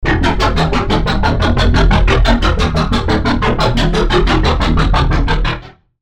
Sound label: Bass; Unknown Creature; Routed Sound; Vital Expansion - Chaos Bringer Vol.1